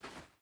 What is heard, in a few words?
footstep hide sand walk; Rust